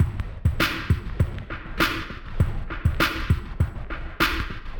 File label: Ghost Loop 100BPM, drum loop